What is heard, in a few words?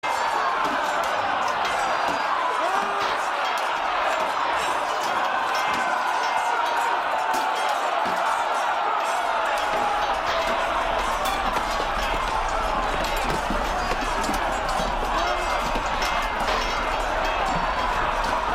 sword fight